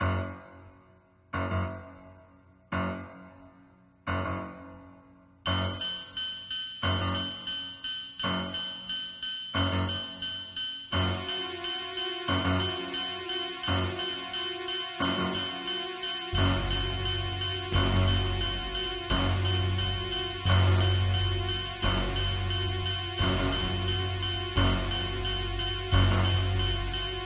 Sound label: Pickem Music Loop; Halloween